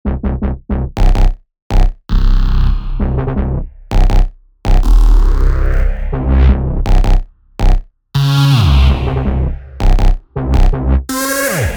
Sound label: Bass Crusher Bad Synth; rave generator bundle